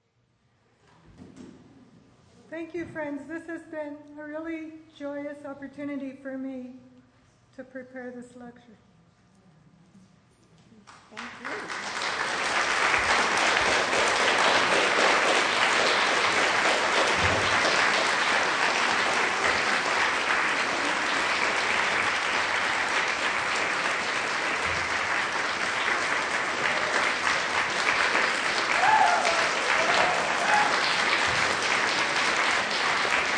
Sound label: Thankyou applause; Quaker gatherings in Canada